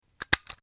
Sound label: stapler